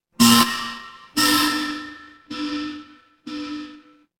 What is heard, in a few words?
fire electric zap